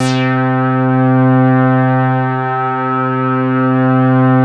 MOOG BASS C4; Best Service Dream Experience

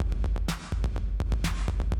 125, Classic Drum Loops

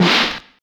SD, Drum & Bass, 170 BPM; Ueberschall; Drum & Bass Flash Trackz

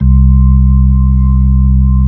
B-3, Brite, Keys of the 60s and 70s

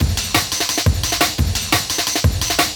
DNB BREAK

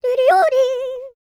YODEL; ILIO; Vocal Planet

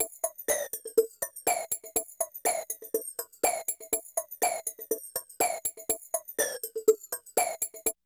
SLIPERC; AMG; Global Trance Mission VOL-1

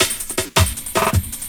LOOP SD, Drum & Bass, 160 BPM

